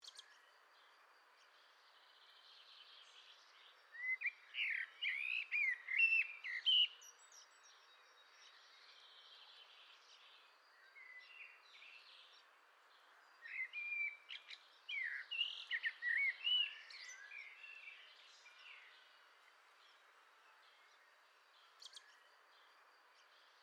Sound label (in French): Merle noir